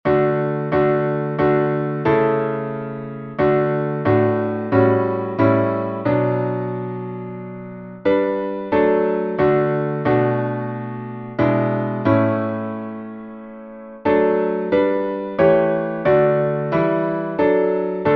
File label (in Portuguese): salmo playback